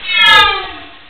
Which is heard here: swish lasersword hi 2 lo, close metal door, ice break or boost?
swish lasersword hi 2 lo